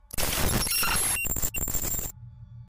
glitched sound

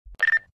radio bleep

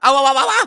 awawawawa

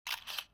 camera; vrchat; ugandan knuckles

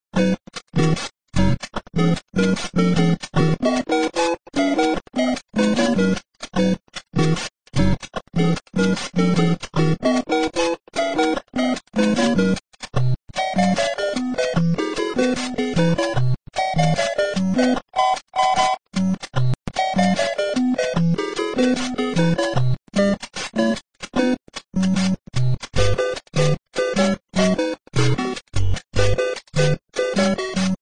reggae; mario

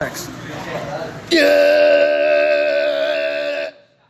stupid yell

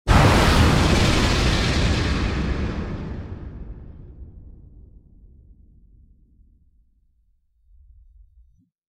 beam; ryu

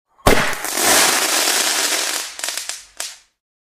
small fireworks

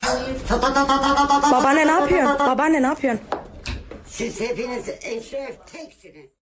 kek laugh

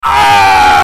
bird

